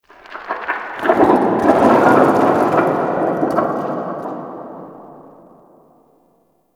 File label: Rock Fall Cliff Distant; Ambience; Warframe